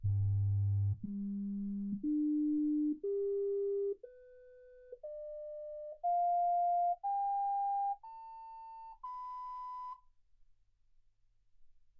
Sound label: sinus tones 48kHz